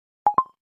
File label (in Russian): zariad lg